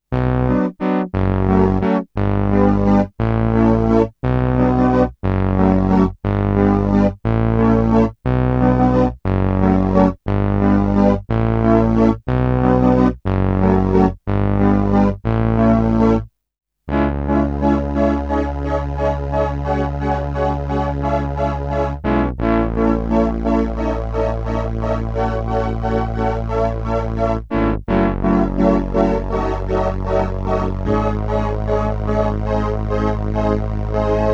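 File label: crumar performer brass strings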